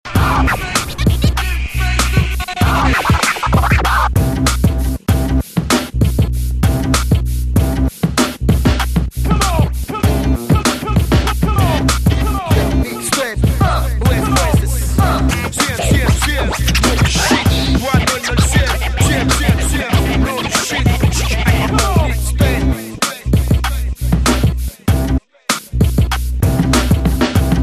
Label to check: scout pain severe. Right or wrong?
Wrong